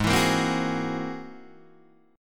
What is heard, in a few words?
G sharp Augmented 9th G sharp Guitar Open D